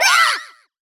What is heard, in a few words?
fail Contact; Heaven Studio Plus; first Contact